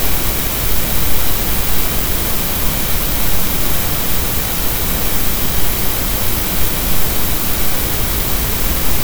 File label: reversed noise